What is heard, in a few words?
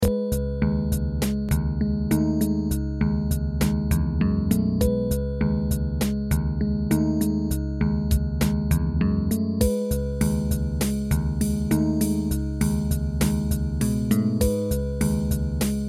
HARP, midi